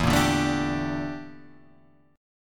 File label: G Minor 6th Add 9th E